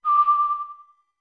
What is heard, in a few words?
Notes Whistle D med; D2; OOT